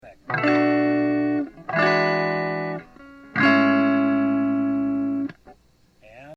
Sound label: leslie off